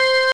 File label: Organ; alone in the dark 4